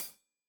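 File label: hat closed, hi-hat cymbals stereo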